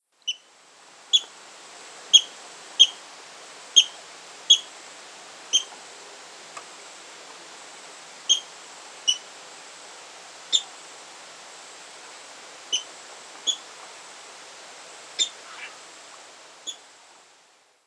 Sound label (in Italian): Porciglione Rallus aquaticus